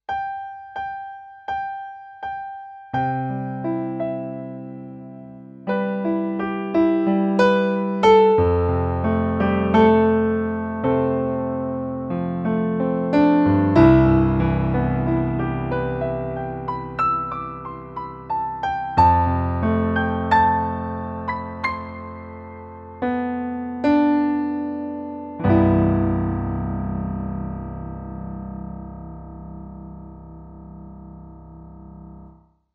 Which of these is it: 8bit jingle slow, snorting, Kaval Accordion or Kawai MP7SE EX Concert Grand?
Kawai MP7SE EX Concert Grand